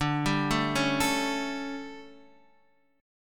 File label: D Major 7th Suspended 4th, Guitar, Half Step Down; DM7sus4